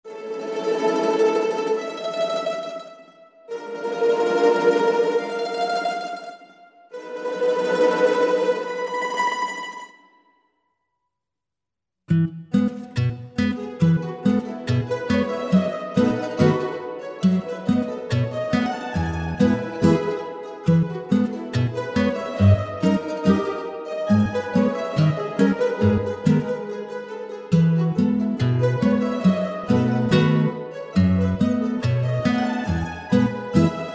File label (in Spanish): Pizzicatto